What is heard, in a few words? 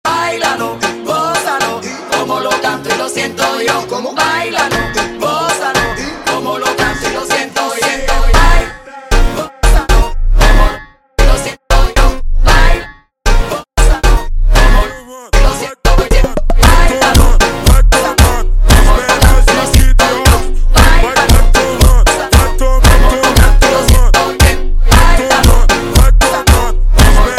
Funk slowed